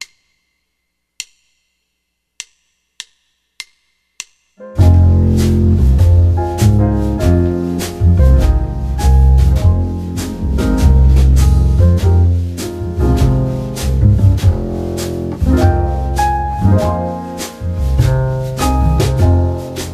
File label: gm7 alto